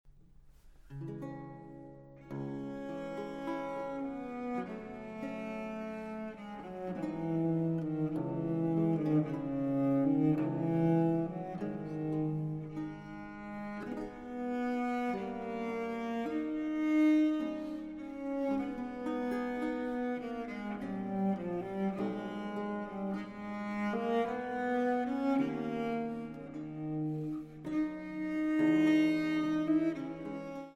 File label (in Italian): Sonata III a violoncello solo; Domenico Silvio Passionei Sonata III a violoncello solo e bc. en re menor: III. Adagio; arcantus Musikproduktion; El Galeón